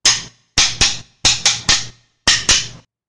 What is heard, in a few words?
Sticks Clapping